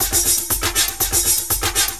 Windy Beat, Electronic Beats; Sonic Foundry (Sony Creative Software); Myths of Technology

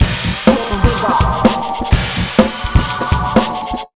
beat, Electro 125, Big Beat Drumloops

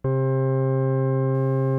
CX3 OVDRV, CX-3 organs; Greytsounds

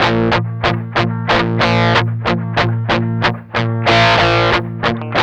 med rock 8th, guitars; Spectrasonics; Hans Zimmer Guitars VOL-1; 102-MED ROCK

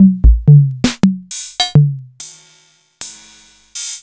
Korg Rhyth-55

